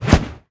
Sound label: Basic Swish M